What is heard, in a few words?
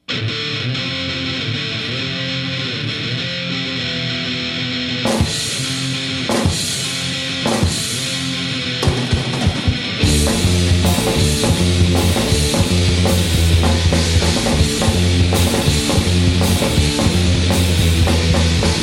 cellos